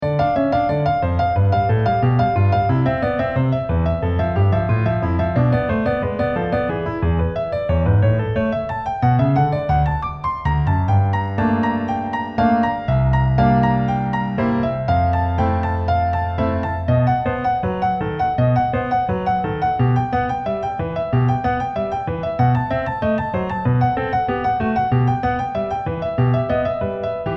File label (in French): PRELUDE EN RE MINEUR